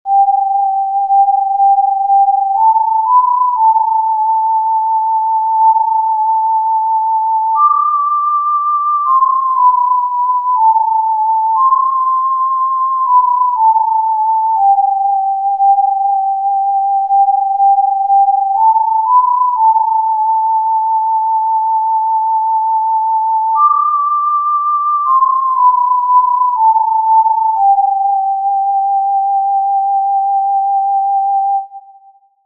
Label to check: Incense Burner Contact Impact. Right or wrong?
Wrong